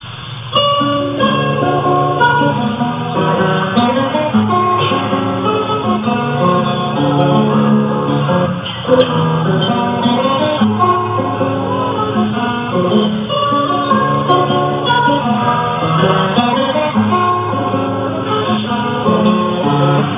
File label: choro, guitar